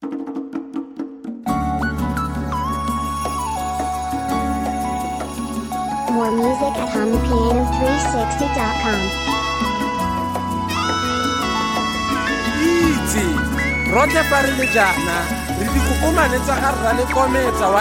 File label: kwaito